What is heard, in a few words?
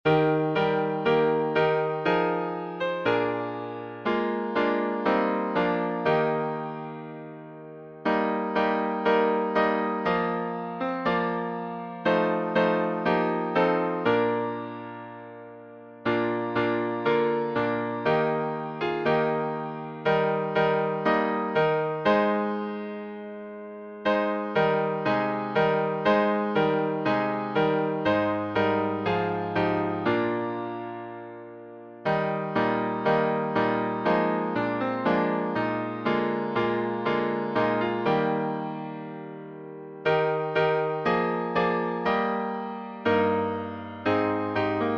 Hymns